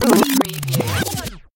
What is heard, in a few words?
Turntable Scratching Backspin